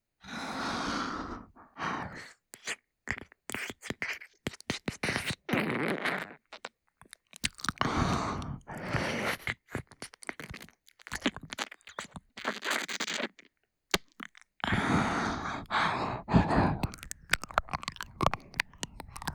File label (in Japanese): 耳舐め（右）舔耳